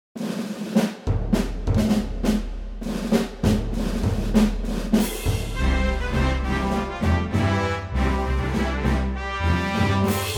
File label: western